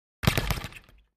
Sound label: Boxing Hit Speed Bag, boxing